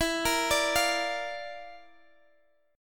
Bb Major E; Bb/E arpeggiated; JGuitar

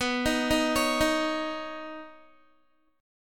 B Major Add 9th B Guitar; arpeggiated; 18,18,15,18,15,x

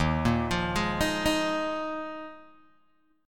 Eb Suspended 2nd Suspended 4th, Guitar, Open G

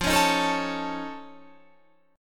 F Augmented 7th F, chord

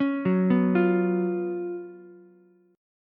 Gb Major 7th Suspended 4th Gb Ukulele; GbM7sus4; arpeggiated; 6,6,7,8; JGuitar

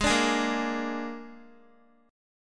Ab-Suspended 2nd Flat 5th, Guitar, chord; x,11,12,13,10,x; 4E,4A,5D,5G,5C,6F; strummed; JGuitar

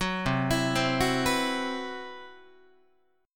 C Major 7th Flat 5th, Guitar, chord; CM7b5 arpeggiated

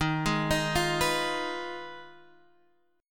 F Minor 7th Flat 5th D sharp

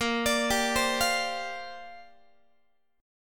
A sharp 6th Add 9th, Guitar; A#6add9 arpeggiated; JGuitar

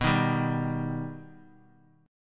B Augmented B down; strummed; JGuitar

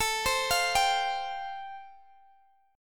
A Minor 7th Sharp 5th A Guitar DADGAD, chord; Am7#5; arpeggiated; chordshape; x,x,19,17,20,17

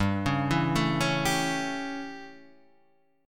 A 7th G, chord

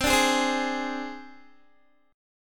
C Suspended 2nd Sharp 5th C down Guitar; Csus2#5; strummed

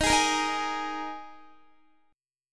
Eb Suspended 4th Eb Guitar 4E 4A 5D 5G 5C 6F; Ebsus4; strummed

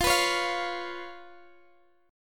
B Suspended 2nd Flat 5th, F, chord

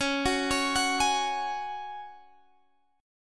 G sharp 7th Suspended 4th C sharp

